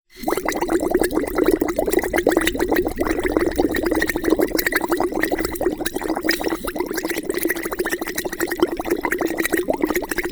Bubbles in Glass Bottle Small, Gurgles, Higher Pitched; LukasTvrdon; Design Source 192